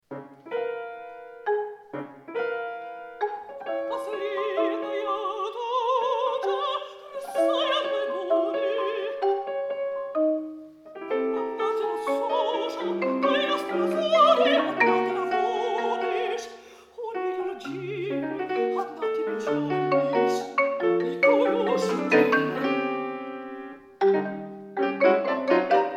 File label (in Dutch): Middenstem, marimba en piano